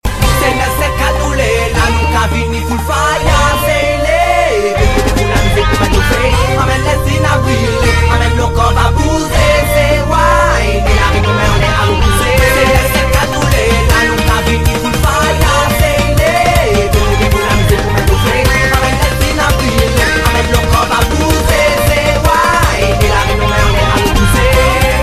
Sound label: ringtone